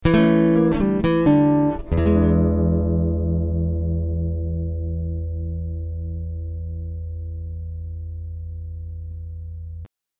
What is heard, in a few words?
Acoustic Blues Guitar